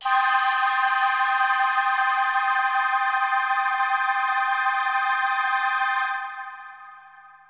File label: synth pad ambient 160 C; Sphere